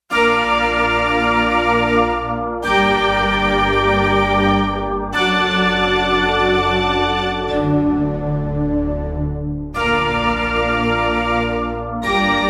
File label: Harpsi Stack; Digital Synthesizer